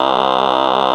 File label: VOI FEMA, vocoders; Sound & Vision - Gigapack I CD 2